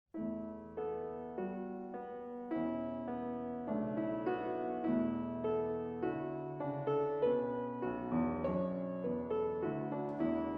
Sonatina For Bass Tuba & Piano, Andante Affectuoso